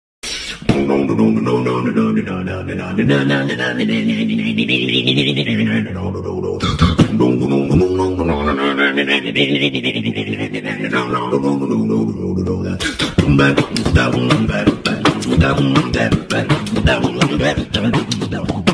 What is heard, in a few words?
man beatboxing; meme